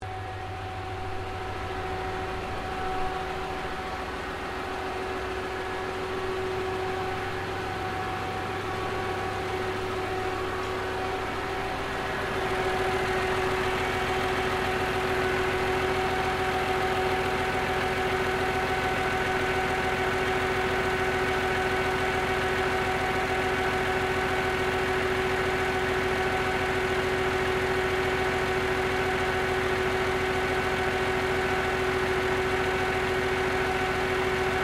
Generator engine diesal operating, Sounds onboard; Boats; Vehicles; Sounds onboard HMAS Castlemaine

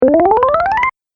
Zippy Bubble Bonus, video game sound effects

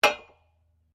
SLEDGEHAMMER METAL IMPACT MEDIUM; Working with a sledgehammer; Hammers; Tools

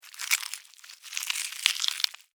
Insect, Eat, Chew, Bite, Bug; Rip, Tear, Flesh; Insects Eating; Blastwave; Animals:Insects

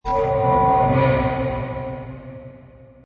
Hi Tech Panel Servo Button; High Tech Sound Effects; Lynne Music

